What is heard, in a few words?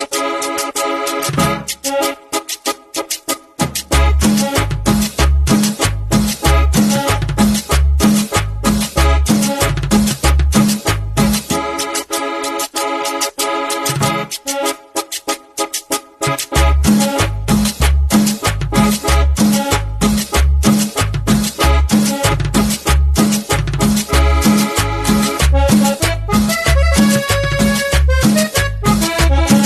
Cumbia Buena Ringtone